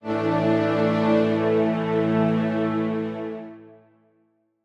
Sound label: Strings start